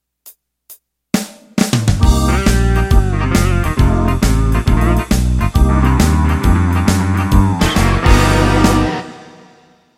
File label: Blues Shuffle Intro